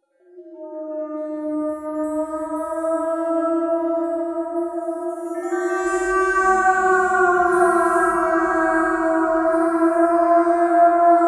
Scary High-pitched Ghost, A scary ghost squealing in your ear as it approaches.